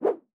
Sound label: Whoo